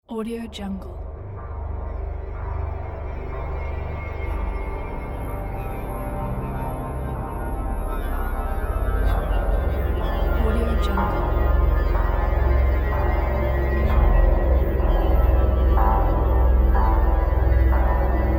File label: pulsating suspense